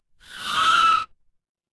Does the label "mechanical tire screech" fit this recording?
Yes